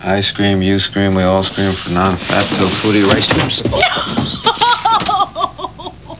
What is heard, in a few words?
i scream